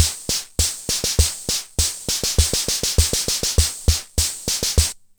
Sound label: March; Eminent-Solina Rhitmix